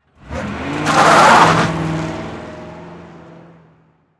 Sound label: Auto Police Car Peel Out; Transportation